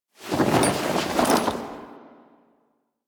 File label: Camp Canopy Flap; Warframe; Quests; Veilbreaker; Kahl Camp